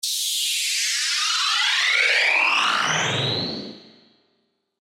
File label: FX Encore; revealed serum 2 bass tech house vol 1